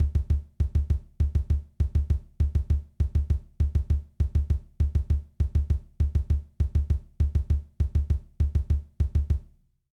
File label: Foot Exercise, drum